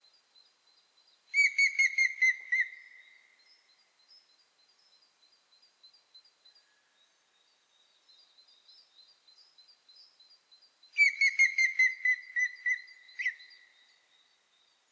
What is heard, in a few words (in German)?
grauspecht